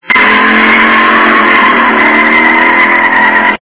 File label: race start